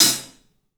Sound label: HAT, CLUBHAT, 3D Drums; 3D Sound